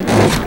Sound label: spring, sled; WhiteOut